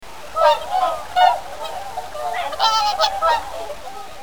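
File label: whooper swan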